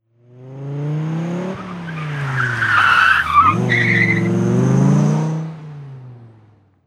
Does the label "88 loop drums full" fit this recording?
No